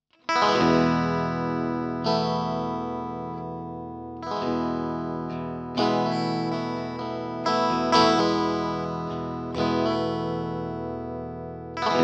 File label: Strat